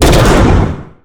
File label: impale; weapons; dota; nyx